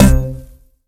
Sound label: pong player paddle; Pitch Black Audio Pong